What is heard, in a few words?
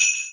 xmas sleigh bells